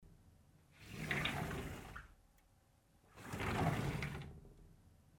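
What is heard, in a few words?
cupboard sound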